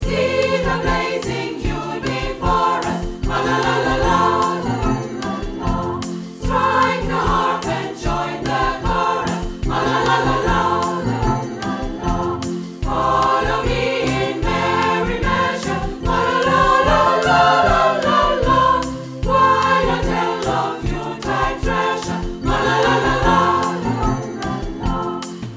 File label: Deck The Halls